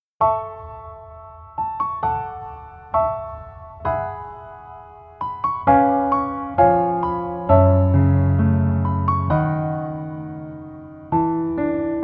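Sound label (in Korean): Piano version 멜로디 MR 여자키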